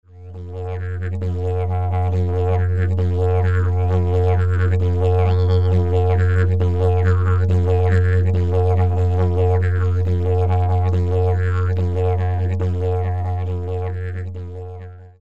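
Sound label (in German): Ahorn, Didgeridoo, Selbstgebautes aus Holz; mein erstes Selbstgebautes aus Holz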